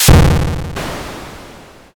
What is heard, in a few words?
thunder magic